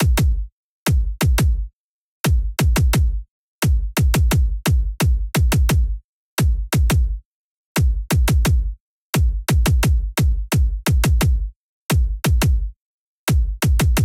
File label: bgm neon kick; Pac-Man Championship Edition 2